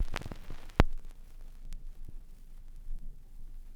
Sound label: X CRCK L, RECORD-FX; XP RECORD-FX; Best Service; XXL Production Effects